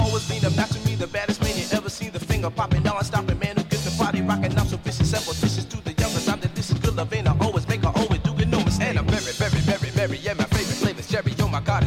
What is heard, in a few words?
boombox